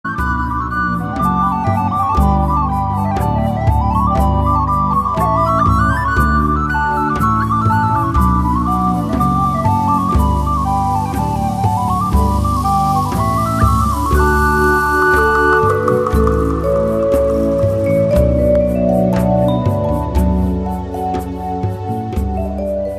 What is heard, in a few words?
Canon